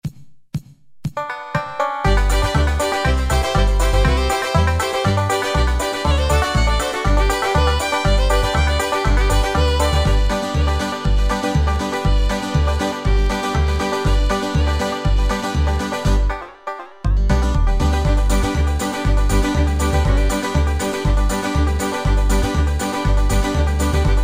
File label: Bluegrass, Yamaha QY70; Preset Styles